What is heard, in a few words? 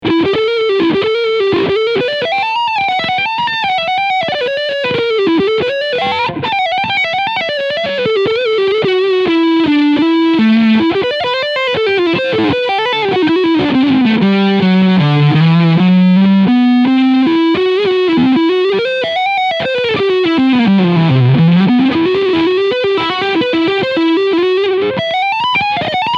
Gibson Les Paul 68 Custom Reissue Leadsound Front Pickup